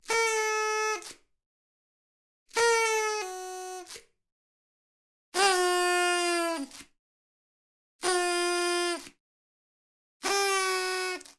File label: Party Horn; Kvv Audio